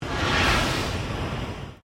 Flame Gas Giant Burst, Giant industrial gas flame jet; Flames (Sound Effects:Objects)